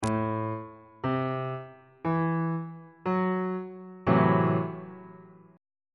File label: a minor 6th chord